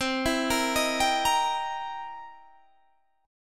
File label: C 7th Sharp 9th C Guitar DADGAD